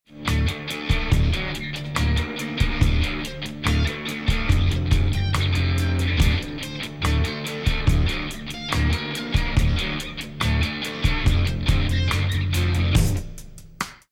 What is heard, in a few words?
lost round; music kits custom